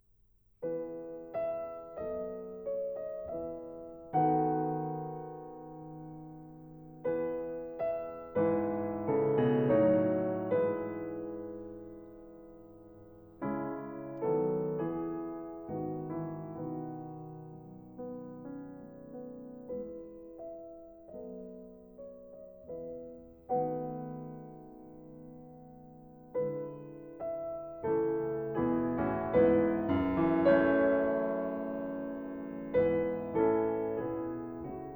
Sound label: Piano Trio in A minor, Andante con moto